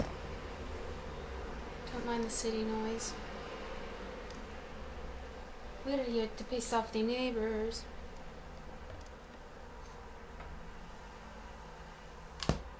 monotron says hi

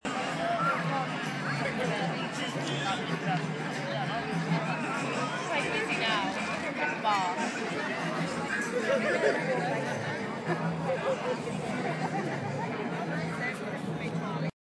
the ambience

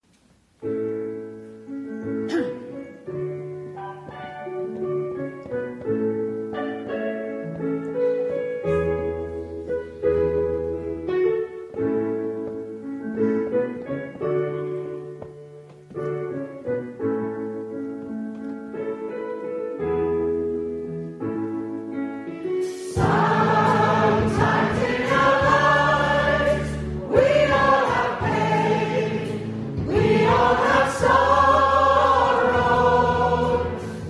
singing with the cancer charity choir; tenovus; pontypridd

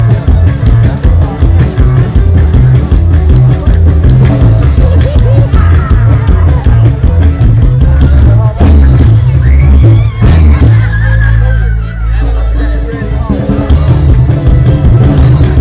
live music from Weston super Mare uk

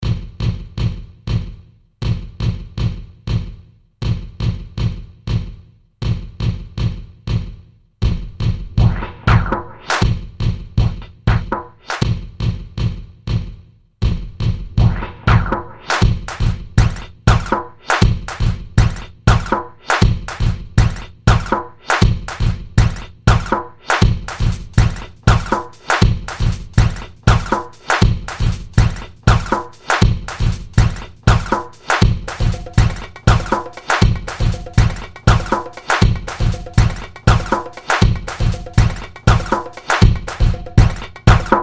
yamaha rx5 drum machine

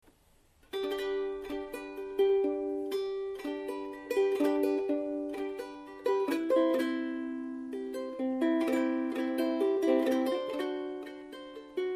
jingle boogie

